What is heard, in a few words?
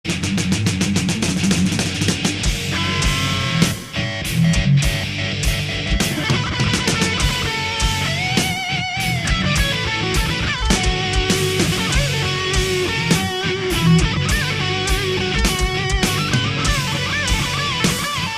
To Live Solo